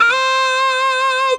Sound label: talk box ohh